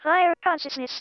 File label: Female vocals and robo-vox